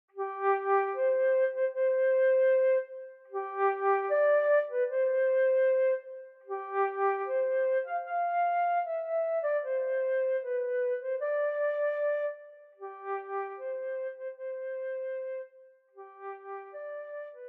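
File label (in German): solo Hochzeitsmarsch Querflöte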